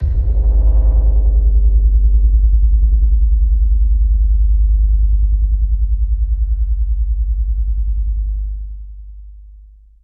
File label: hit low